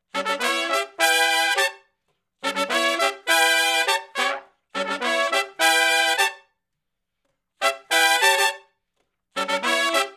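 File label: R&B Riff (A) harmony, Horncraft; Sections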